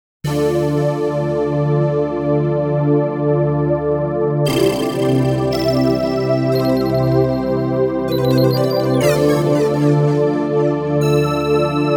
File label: Pad Candy Pop Pitch Mod; alchemy